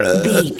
comedy burp

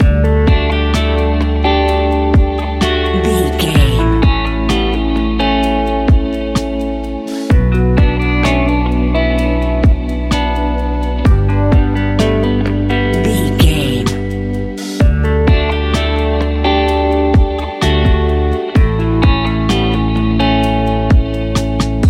calming chill spa world; Video Game

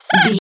goblin fairy effort jump action

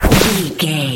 nature spell bush tree whip

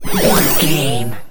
sci-fi device item power up flash

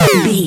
retro laser gun shoot

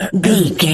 voice male cough interrupt

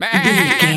goat baa stressed hurt

